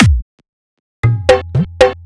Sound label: Dholki Beat